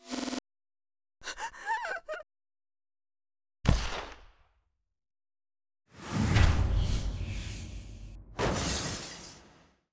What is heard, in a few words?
FX Stereo; Clean Cuts